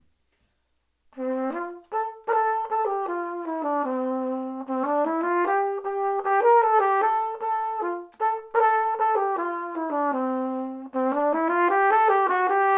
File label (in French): Schottische; Collectocreation